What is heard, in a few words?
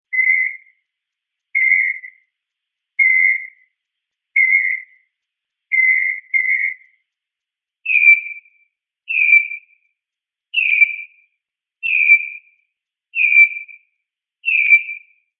Mocking Bird Chirps, A mockingbird chirps.; Birds; Animals